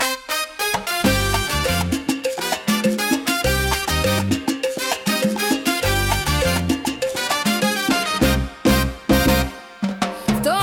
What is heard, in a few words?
son timba